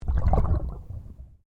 Underwater Movement Swoosh

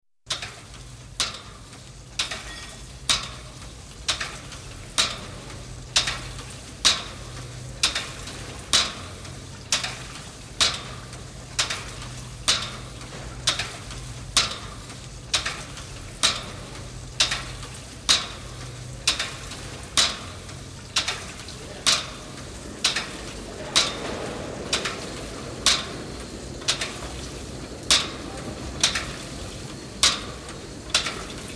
Steam Water Pump, topping-up cold water tank; Train; Trains; Engines Steam; BBC

